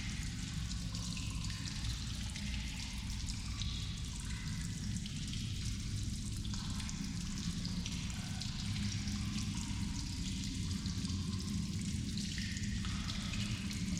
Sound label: tunnels, ambience; pf2e; hells destiny